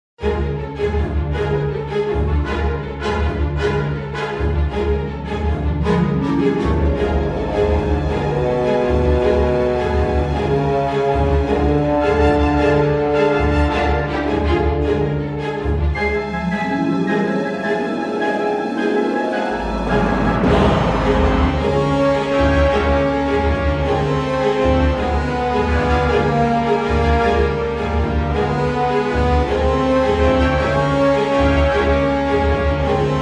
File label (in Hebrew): סצינת מתח